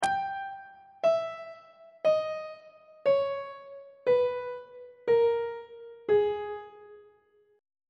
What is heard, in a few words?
g sharp harmonic minor scale descending on treble clef